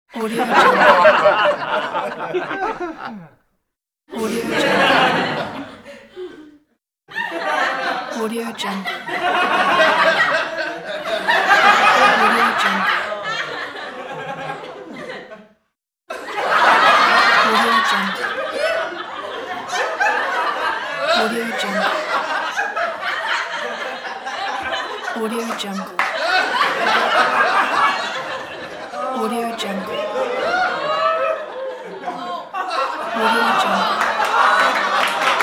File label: sitcom laughter and applause